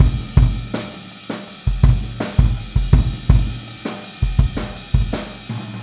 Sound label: Boogaloo Chorus Kick, 82 BPM